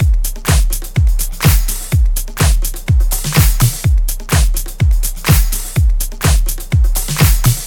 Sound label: music loop drums; disco danny